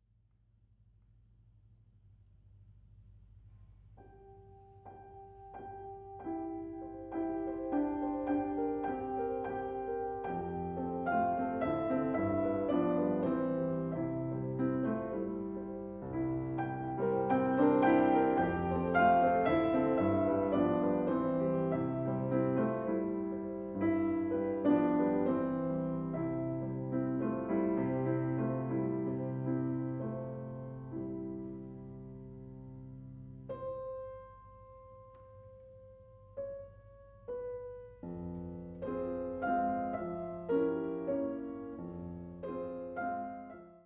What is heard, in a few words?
Ballade in F Minor